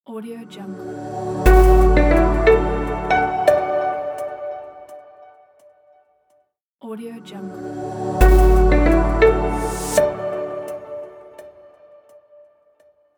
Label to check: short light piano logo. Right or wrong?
Right